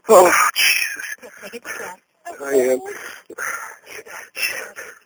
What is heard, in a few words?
EVP